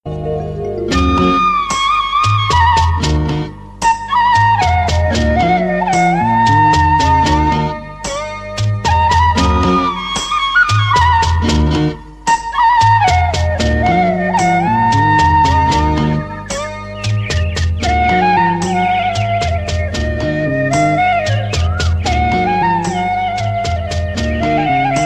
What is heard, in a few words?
90s Tamil Love Bgm Ringtone